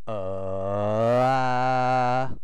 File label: uhah; svencoop; keen